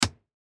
Fire Truck Switch, Fire Truck Switch Click Interior; Emergency; Emergency Vehicles